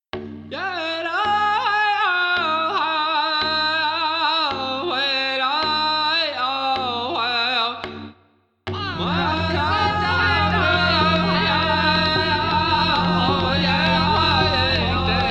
north american chant; Ethno World 6 Complete

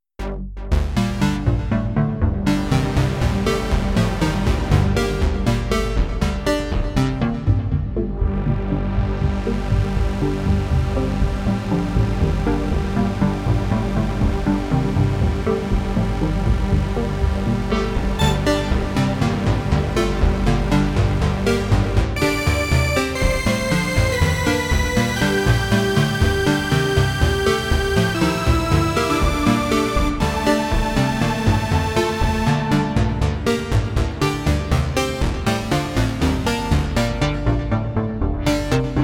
RAW Synth; Rob Papen

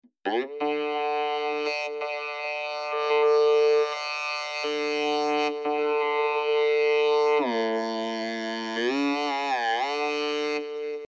vocoder synth; XILS-Lab; Vocoder 5000

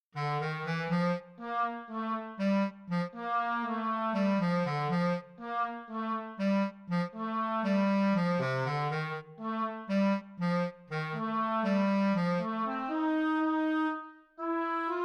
Woodwind; Sonivox; Film Score Companion; Orchestral Companion Woodwinds; Holzblasinstrumenten

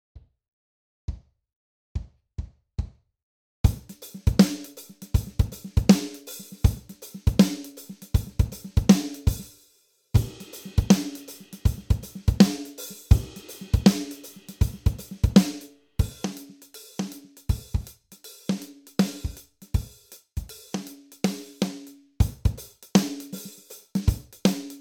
voyager drums; Best Service

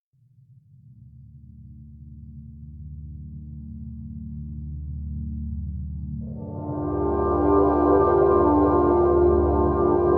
celtic era; eduardo tarilonte

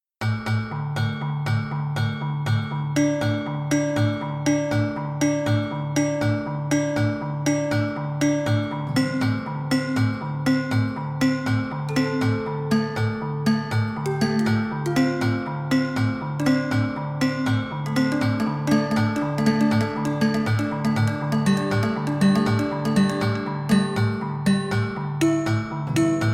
gamelan; Indonesien; Bali; Java; Samplelogic; Gamelan Musik

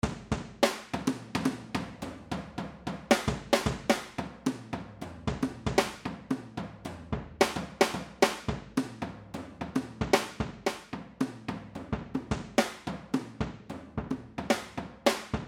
drum, equalizer plugin; FabFilter Pro-Q 2

